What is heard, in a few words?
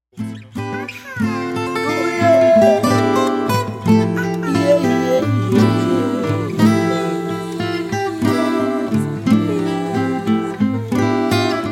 Music (Acoustic Guitar Version)